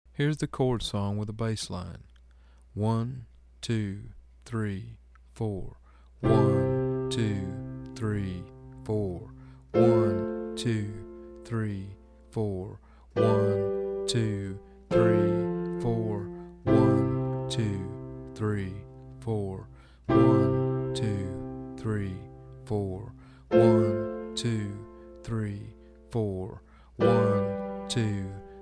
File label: Bass Line Slow